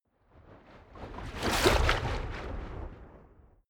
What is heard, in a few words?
waves splash small